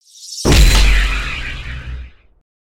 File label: Flashbang; Flashbang With Lead; aronwk collection; ndaudio